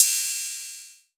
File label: Ride, Cymbals